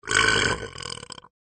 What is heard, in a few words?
burp; assassin m4a1